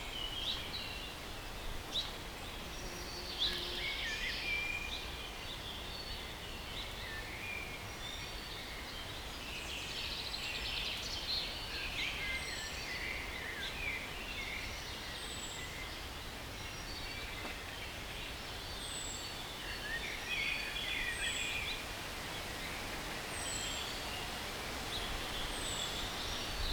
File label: forest, ambiance